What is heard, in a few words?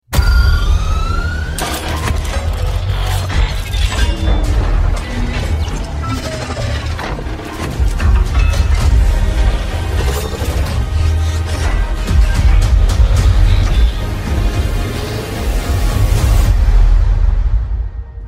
bumblebee ringtone